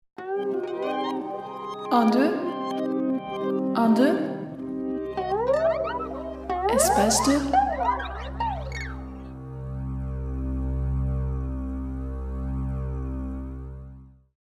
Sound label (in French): variation avec voix